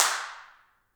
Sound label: handclaps, Heart of Africa